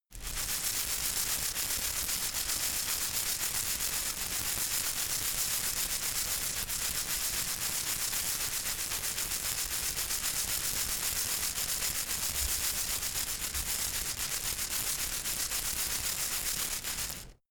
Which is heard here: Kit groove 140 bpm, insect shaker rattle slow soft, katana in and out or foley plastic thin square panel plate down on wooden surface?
insect shaker rattle slow soft